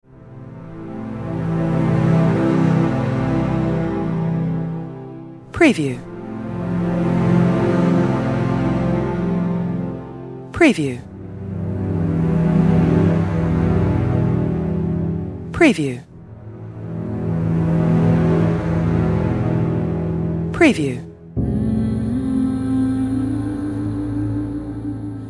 Dramatic Nostalgic Emotional Voice Soundtrack

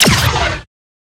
Blaster Shot

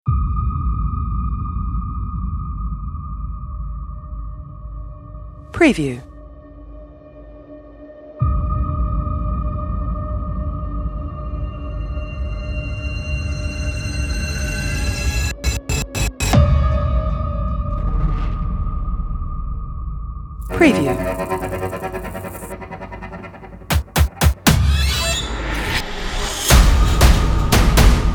Cinematic Dark Horror Fear Scary Trailer Music

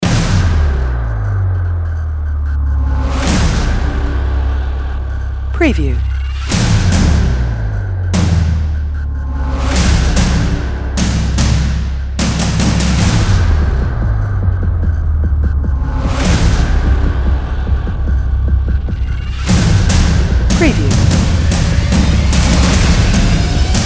Cinematic Action Drums Movie Trailer Intro

